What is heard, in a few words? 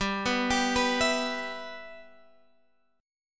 E Minor G, Guitar